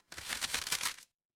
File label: paper fabric crumple